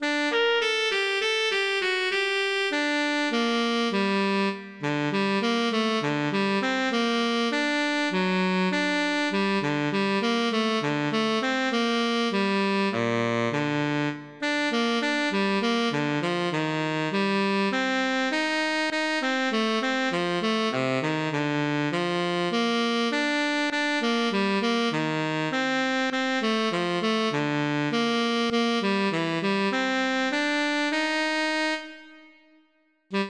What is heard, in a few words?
Classic medley T-Sax; Staldophone